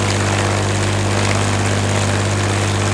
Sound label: airplane; ambient; World of Tanks Blitz